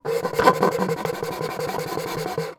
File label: straw slide and out quickly multiple of plastic lid drink cup; food drink; macdonalds